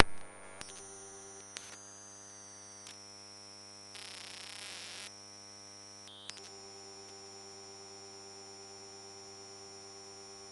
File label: old cable tv box activity; Sonniss GDC